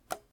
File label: button release